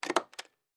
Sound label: Stapler Staples